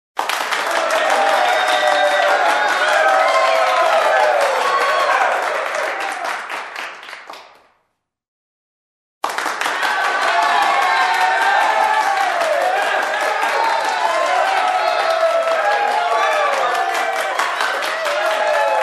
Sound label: Loud ovation from a small crowd indoors; standing ovation